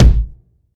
rich mid range kick drum sound g sharp key, steel kick drum sound